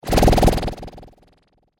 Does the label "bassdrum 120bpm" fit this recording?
No